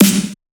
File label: normal hit clap